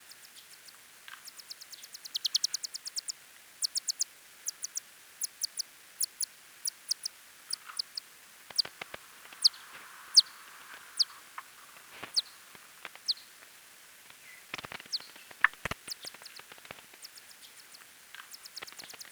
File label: Nyctophilus geoffroyi